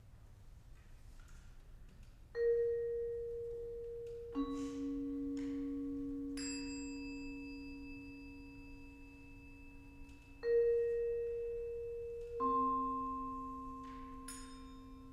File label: Duet for Horn and Bass